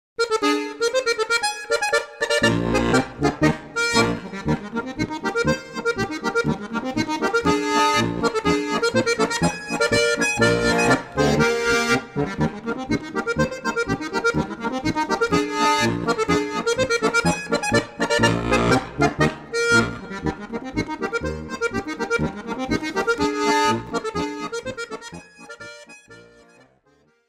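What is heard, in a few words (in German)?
Harmonika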